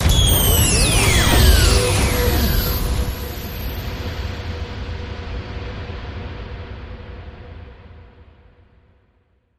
Blow It Up Sweep

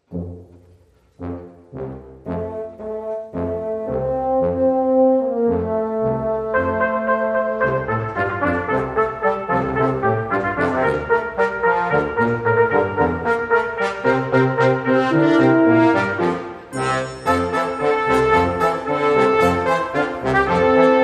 A Brassy Jingle Bells, No Flute Mix

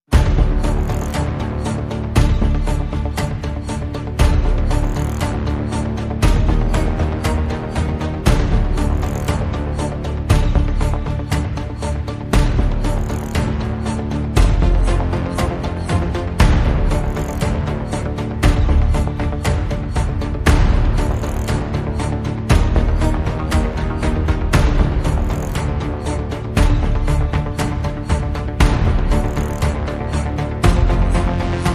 Heart Pounding, No Guitars

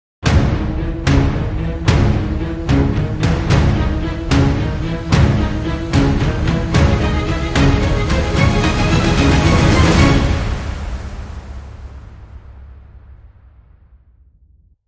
Dramatic Sting, Less Cymbals

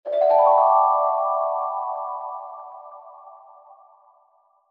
Mystery Bell

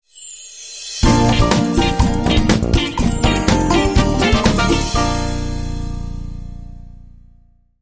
Spanish Rose, Sting

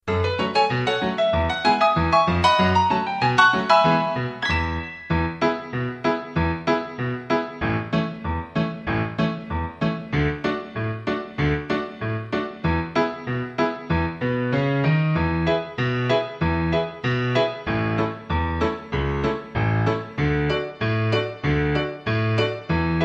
Ragtime Piano

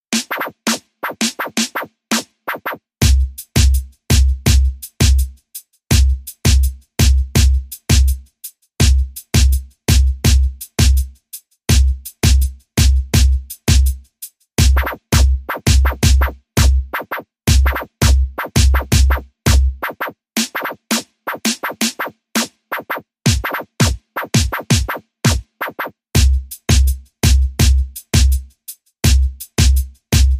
Neutron Beat

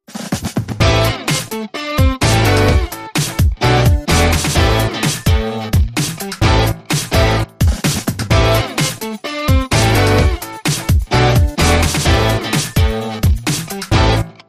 Funk

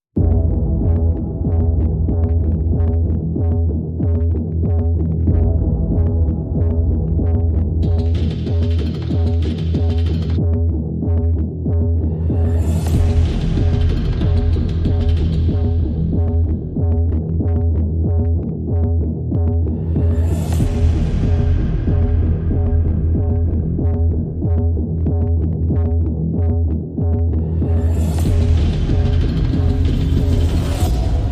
Perc Pulses and FX Only

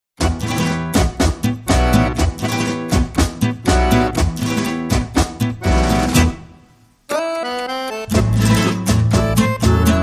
No Violin

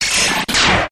Harsh Static Burst

